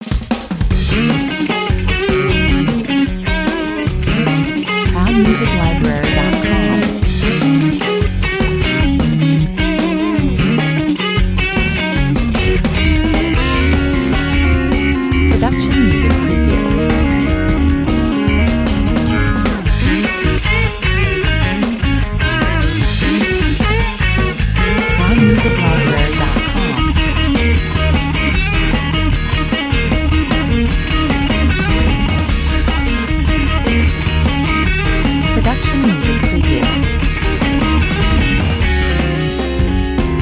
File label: slap and funk 76 bpm